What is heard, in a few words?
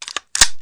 load; PC Gamer IT CD 32